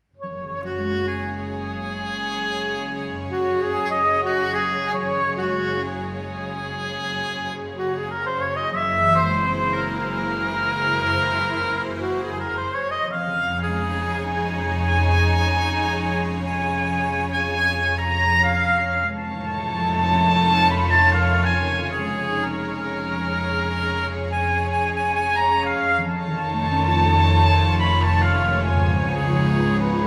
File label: soprano sax